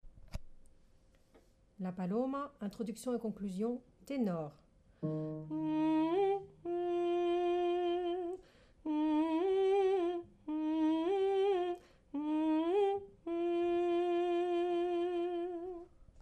paloma Tenor

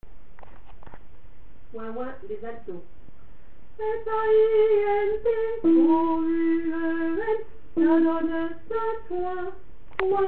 Alto

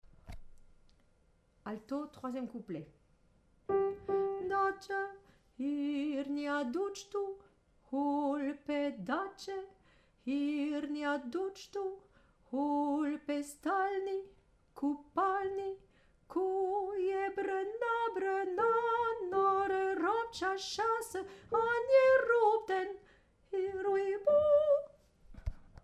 Alto

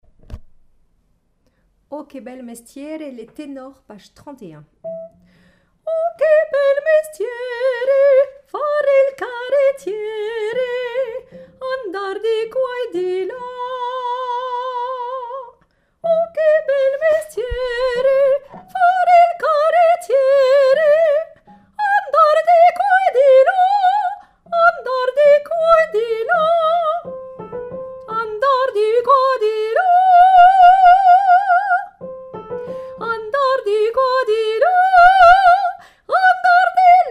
Tenor

